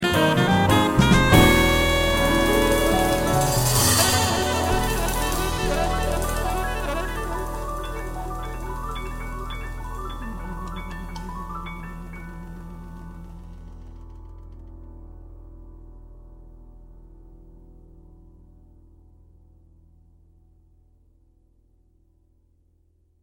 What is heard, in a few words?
bass